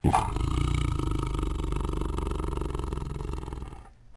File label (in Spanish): otorongo